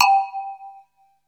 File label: PERC G4; SEQ AND PERC; Music Store - Claudius Diemeter Schwerlos